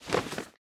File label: library furniture scrolls